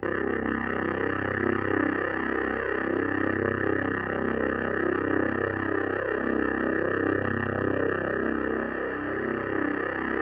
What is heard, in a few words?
C1, Synth Leads; 90 s Sample CDs; NiceBeats (NiceBytes); Studioline 09